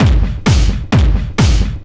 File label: techno, DRUMLOOPS